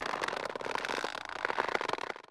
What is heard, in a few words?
rope takeoff, handcuffs; Items